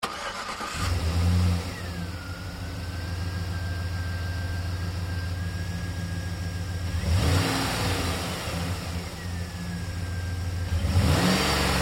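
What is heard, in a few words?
Truck GMC Savana Engine Start Revs Off Light; Trucks; Big Room Sound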